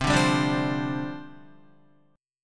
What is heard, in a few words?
C Suspended 2nd Suspended 4th, down, Guitar